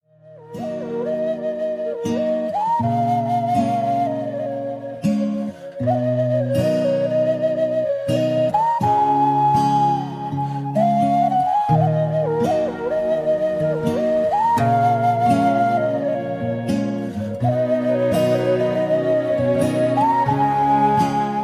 kites flute; dance

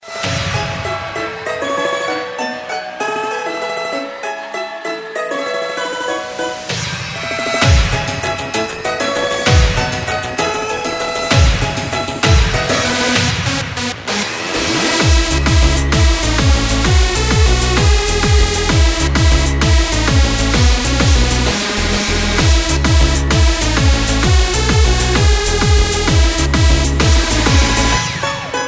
remix, electronic ringtones; yahoo

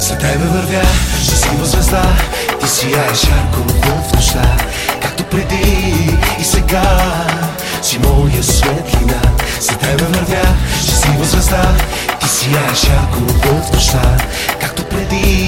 very good dubstep, ringtones

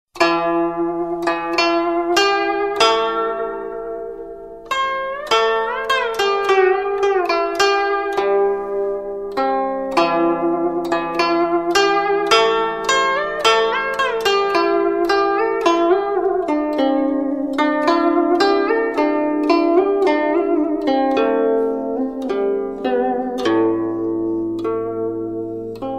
Guzheng tune

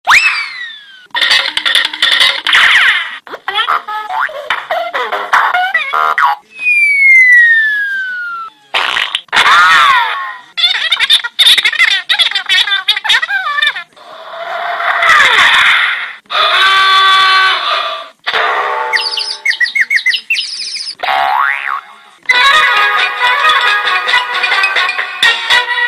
Cartoon Mix, ringtones

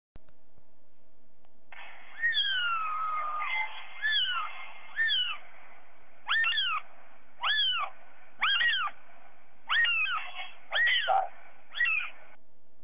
little cat; animals

